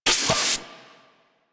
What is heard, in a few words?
Codex Activate; Warframe; Liset; Hub; Machines; Tenno Codex